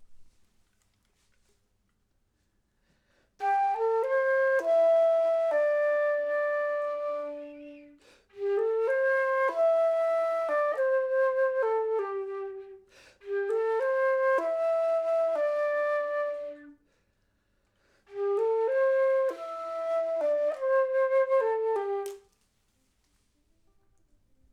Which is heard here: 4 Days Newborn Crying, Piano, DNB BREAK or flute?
flute